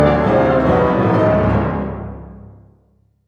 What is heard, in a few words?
dramatic dun